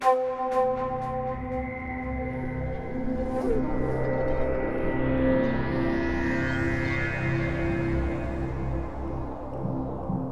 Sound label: Stinger Shore Sunrise; Peak (2025)